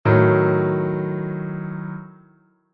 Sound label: D♯°, Chord Inversions